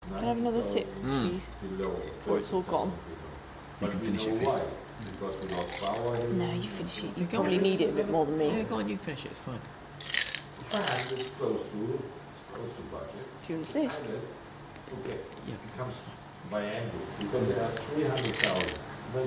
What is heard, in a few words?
lots of lovely ice noise including crunching